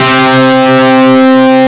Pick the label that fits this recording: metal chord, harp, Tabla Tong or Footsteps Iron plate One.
metal chord